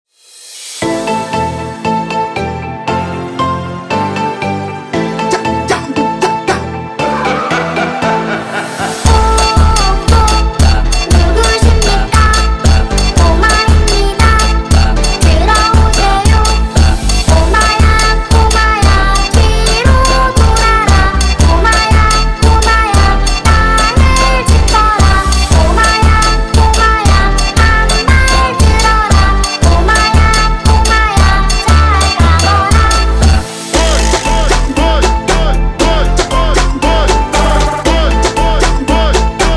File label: Funk Slowed